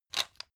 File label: pepper mill fast; soundpool